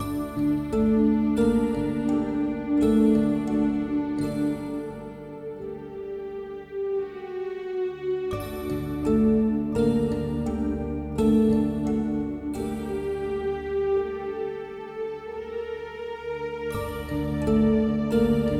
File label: Fantasy Exploration loop; Shockwave Sound - Fantasy Worlds Vol II+III